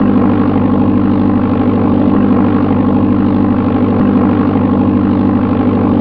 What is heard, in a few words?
biplane interior; tfc